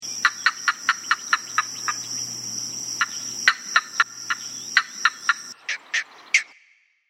Green Heron

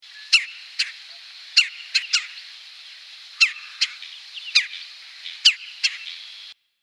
Red Bellied Woodpecker

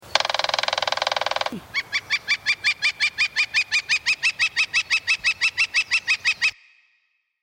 Northern Flicker

